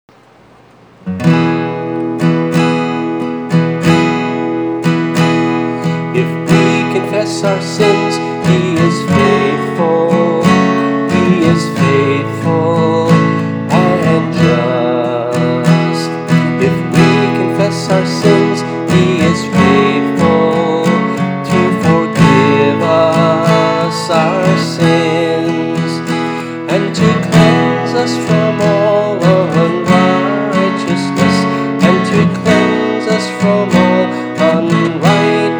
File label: guitar